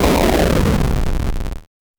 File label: explosion